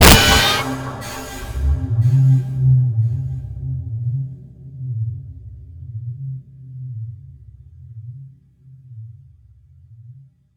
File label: Jumpscare